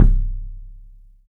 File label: THUD KICK; 3D Drums